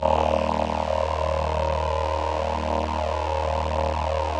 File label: VP-330, String Machines